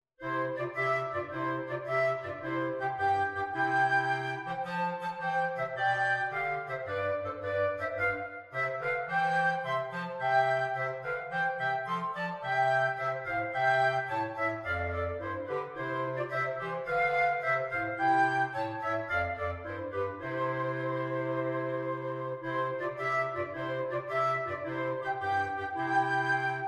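Here We Come A-Caroling flute clarinet bass clarinet; Christmas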